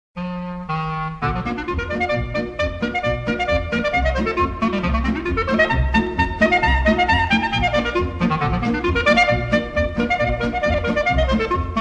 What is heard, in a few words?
polka